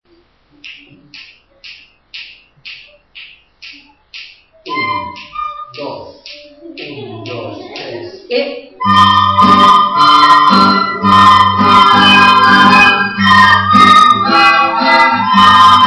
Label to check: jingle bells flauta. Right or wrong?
Right